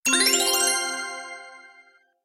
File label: match; super color lines